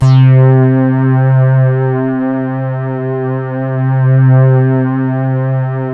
BACKTIME; Sound Gallery - Charged

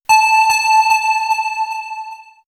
Swirls, pads; X-Static Goldmine

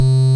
Buzz; Bitkits

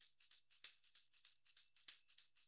TAMB 96, SF LOOPS 96, NYC Percussionworks; Sampleheads

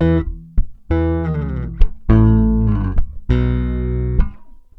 BAI BS EM, BASS BAION

